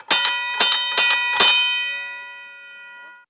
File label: boxing